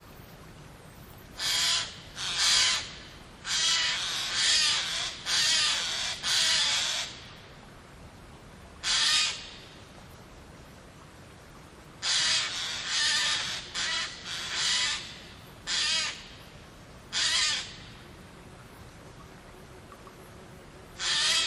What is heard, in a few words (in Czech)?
Sojka Obecna; poznvme bory